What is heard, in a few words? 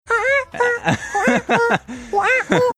singing portion song funny